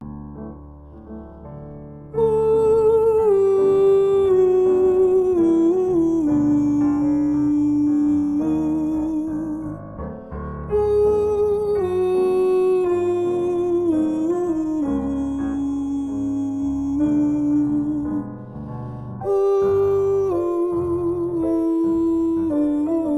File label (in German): Warm up tief; Einsingen tiefe Stimmen; Stimmbildung